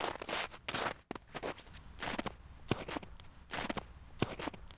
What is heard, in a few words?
Snow step